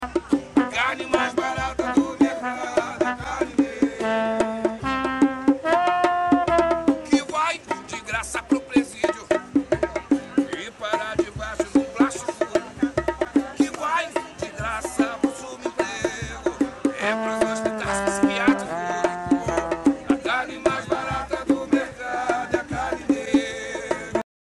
flute

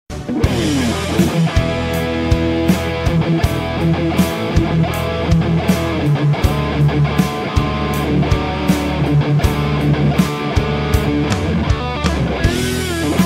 e mixolydian

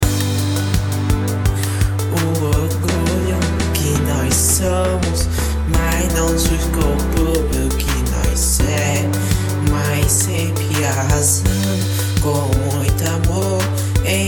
alt rock

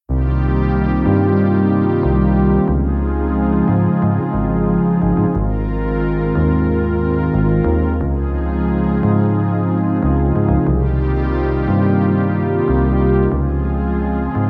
instrumental vibes

